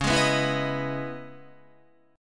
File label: Db Minor 9th Guitar; strummed; 4E,4A,5D,5G,5C,6F